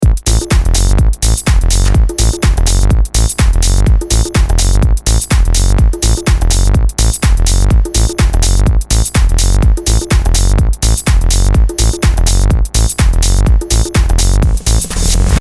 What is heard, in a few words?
Loop with 270Hz cut